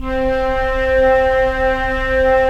FLT FL, Flute; Roland - LA Composer vol. 4

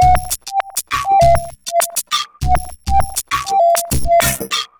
Robo Beat 100BPM, sci-fi Beats

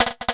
Hard Riff, Percussion; Sonic Foundry (Sony Creative Software); Futurist Drum & Bass

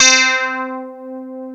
META LEAD C5, Analog Fantasies; USB Soundscan vol.49; AKAI

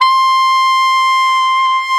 SAX C4, SAXOPHONE; USB Soundscan vol.63; Balkanish Traditions; AKAI

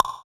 EQ Perc, Percussion Mix; Equipped Music; Loungin House

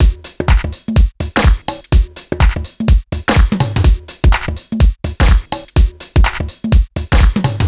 Full Loop 125, Loungin House; Full Loops 125; Equipped Music

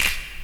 SNAP; 90 s Sample CDs; Best Service Dance Mega Drums

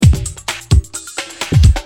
Drums, Perc, Industrial

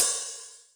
H Opn, Hi-Hat, Yamaha DTXpress

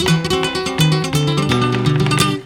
GUIT SOLO; Best Service; World Colours

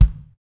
KIK, Real Kiks; Club-50 - Foundations Roland

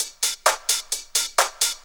hihat 2, 130, drum loops

